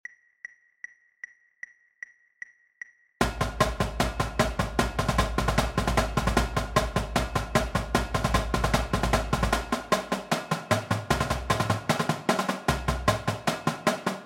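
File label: sequence para, Drumline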